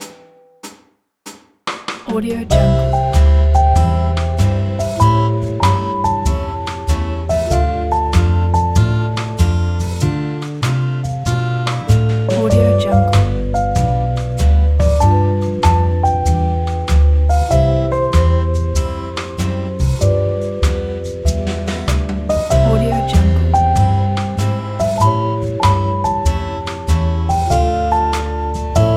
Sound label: groovy relaxed game theme